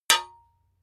hammer hit metal dull

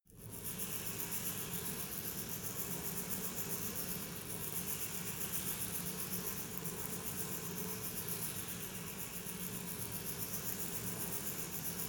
resonator rattle slow soft

